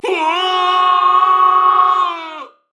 Falling; Paladins; DarkLord

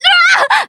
VO Inquisitor Heavy Wound; battlerite

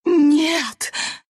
pain death; dota2

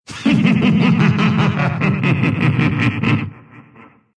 laugh, ru; dota2

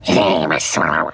Groan; Nibbler; futurama worlds of tomorrow

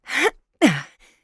Hunter Grunt Jump; Realm Royale; Twilight Huntress

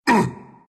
pain, ru; Dota 2; Husk

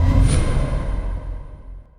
Transfer Spellblock

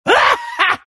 gyrocopter gyro laugh

